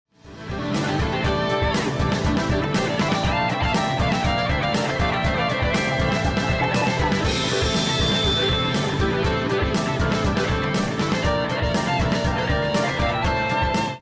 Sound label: choose team; music kits custom